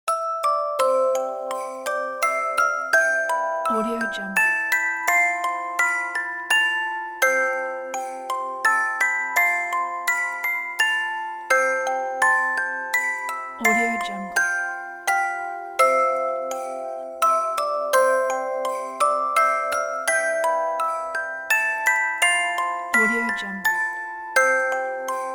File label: christmas music box; the first noel